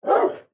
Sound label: wolf bark; Minetest; VoxeLibre; mobs mc